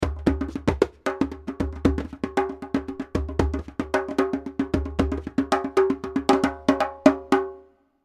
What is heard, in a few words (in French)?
Djembe grand son; Percu